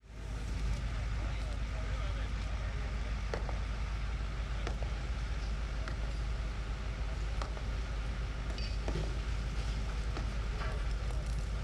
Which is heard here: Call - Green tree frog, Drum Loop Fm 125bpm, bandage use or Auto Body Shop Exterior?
Auto Body Shop Exterior